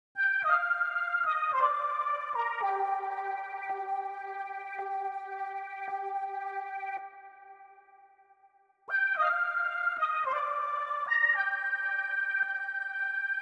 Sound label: 110 BPM C Min Lead; Melody; RnB; Loop Stems; Cymatics; 2022 Melody Collection; Seduction